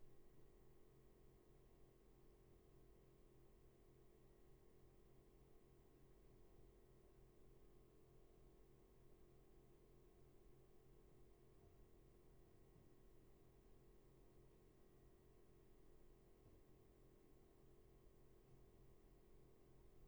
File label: room tone